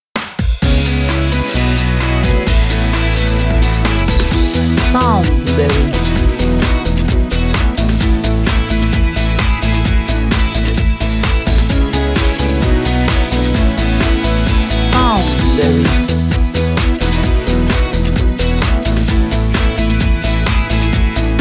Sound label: Game Over